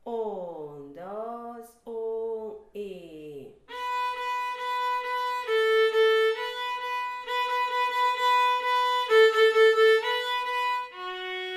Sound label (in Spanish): Danza china